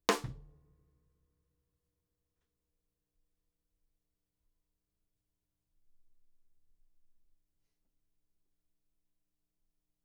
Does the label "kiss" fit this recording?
No